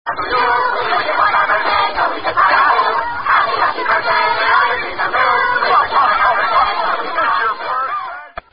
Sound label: Happy Happy Birthday